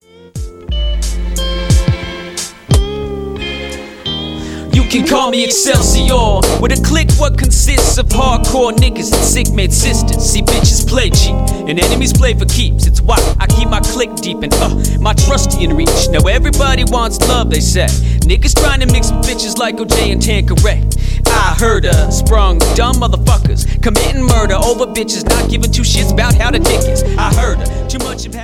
Hip Hop Rap Ringtone

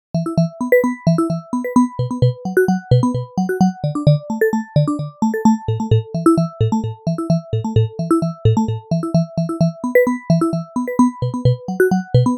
Melodic Simple New Ringtone

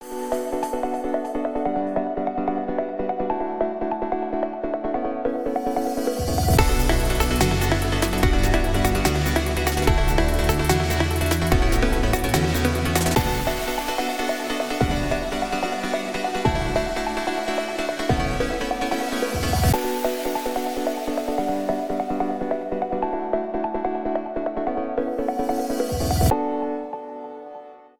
Cinematic Electro

